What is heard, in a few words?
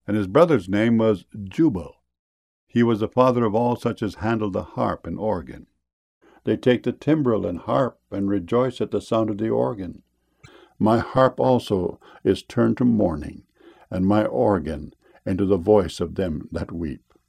organ